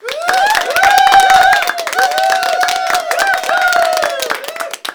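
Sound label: HAND CLAP + CHEER, SMALL CHEER; 1000fx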